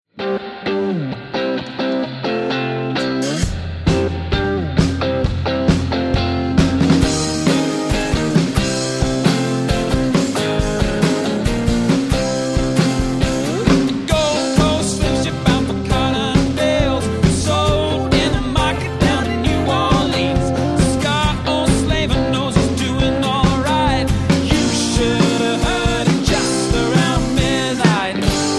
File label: trio